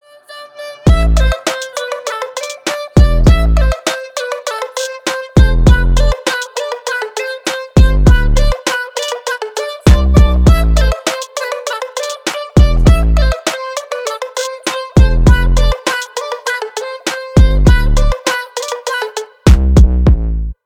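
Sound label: without words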